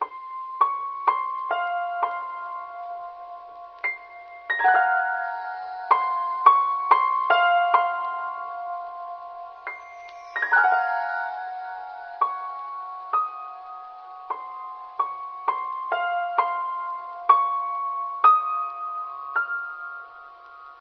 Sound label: piano, Loops, Sad